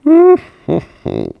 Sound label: Peep